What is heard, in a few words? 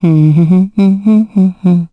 Hum; Hilda; kings raid; en